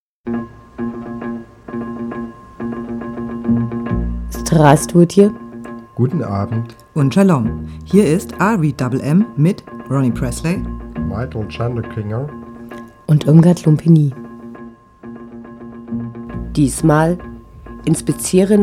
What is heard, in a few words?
jingle